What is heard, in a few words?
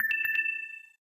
Low Battery